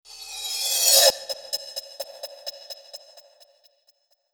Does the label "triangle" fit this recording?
No